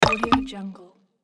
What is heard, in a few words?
soft pop unlock